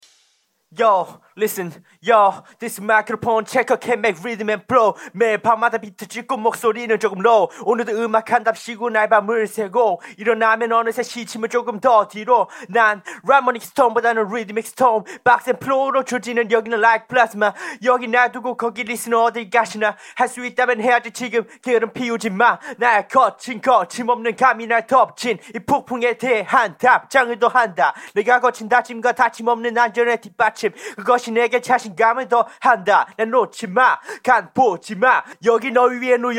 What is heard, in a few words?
rap 95 B; acapella